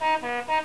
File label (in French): ambulanc